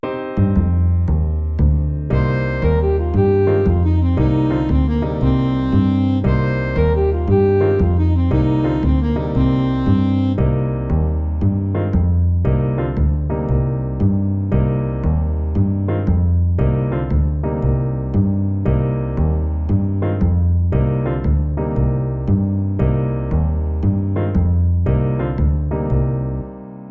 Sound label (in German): Pentatonik Moll Absteigend Swing